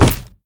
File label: pirate run pegleg; smite; sobek